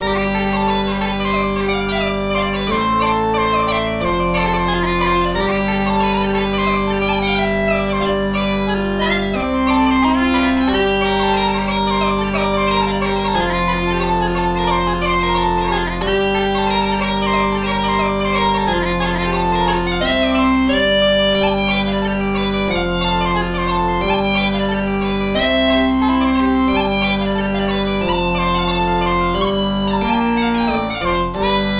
Bagpipes; Growtopia

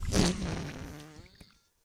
Meat Fart

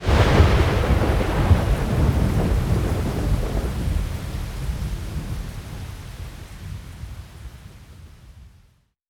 environment lightning

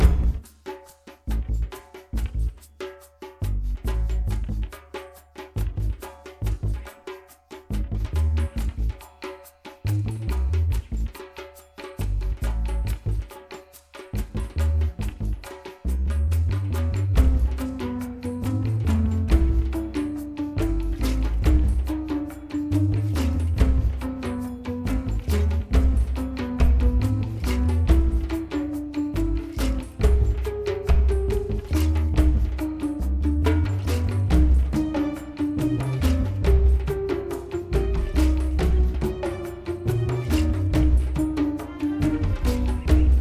Spirestone Graveyard (Bridge Barrel Roll)

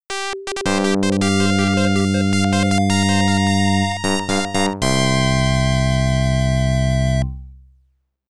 War Victory; Rise of the Phoenix; pc-9801

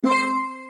Se Decide

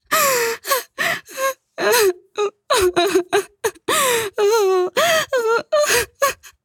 moan

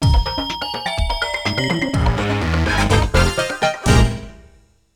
Stage Beaten; hotel mario; Hotel 2

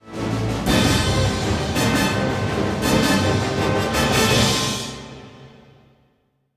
Sting

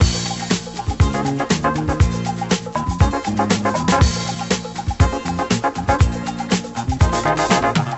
Music; Imagine Interior Designer; 2008 NDS gamerip

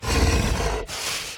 Walk Cycle Burns; Devour 2021 gamerip pc macos

